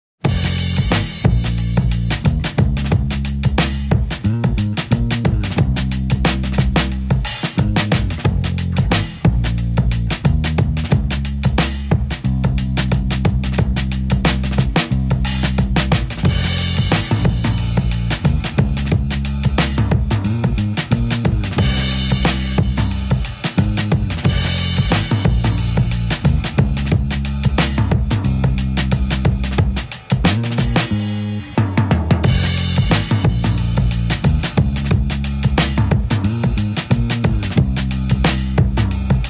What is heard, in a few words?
Drums and Bass